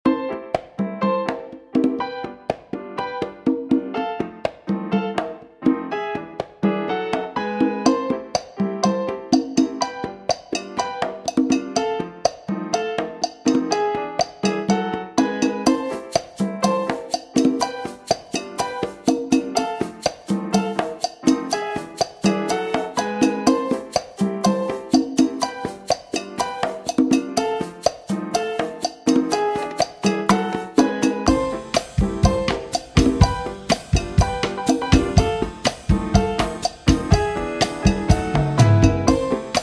Swing Chacha Cuban

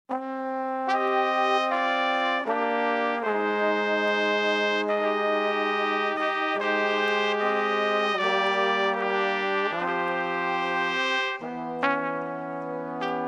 Jazz Orchestra

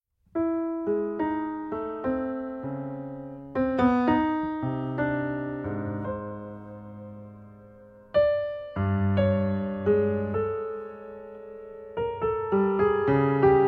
Piano Solo

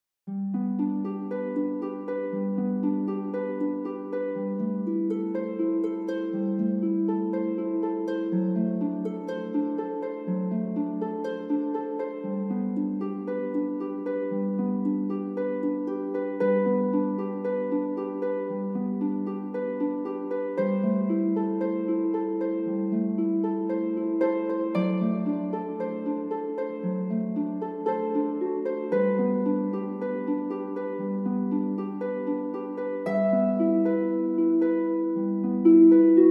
Ave Maria (Harp)

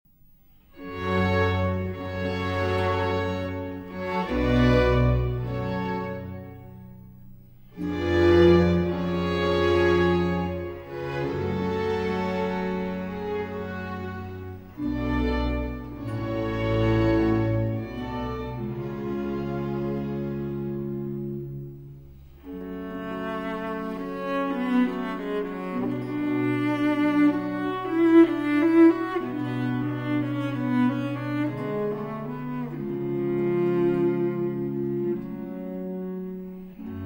Cello Concerto in C Minor, Adagi; Vivaldi; RV 402; 2. Adagio

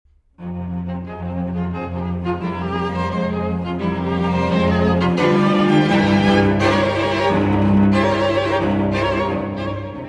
String Quartet in F Minor, Finale Allegro Molto

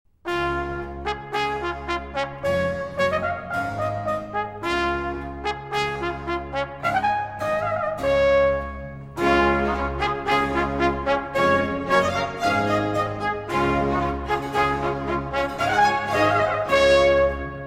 Trumpet; Clarke

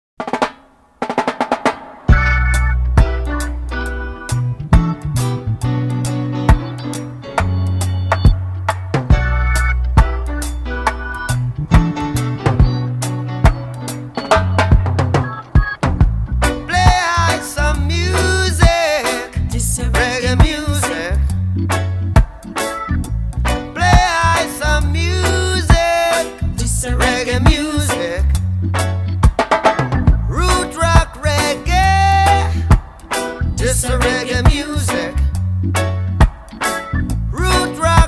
Reggae

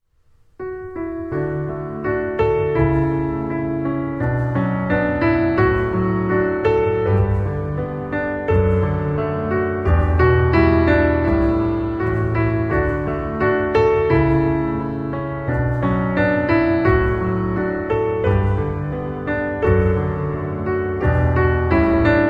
Melody

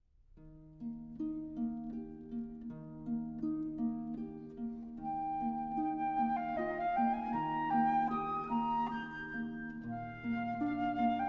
Menuet Andantino Quasi Allegretto

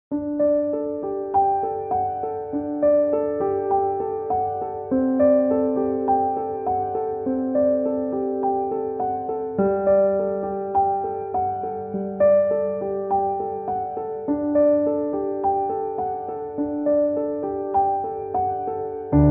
Instrumental Piano

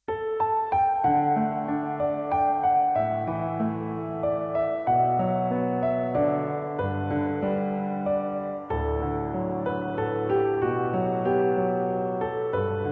Piano Love Songs

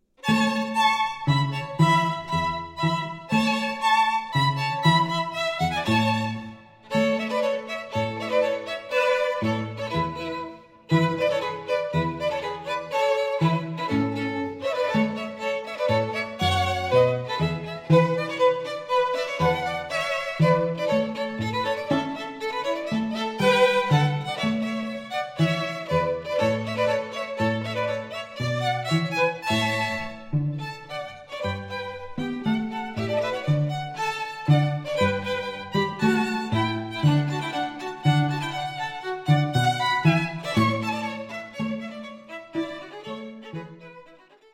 Rondo For Dancing, 2 Violins & Cello; Version For 2 Violins & Cello

